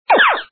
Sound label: shot sound; moon patrol